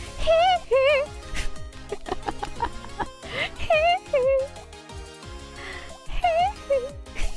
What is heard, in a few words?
hee hee